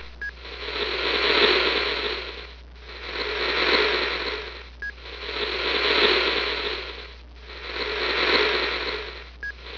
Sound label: respirat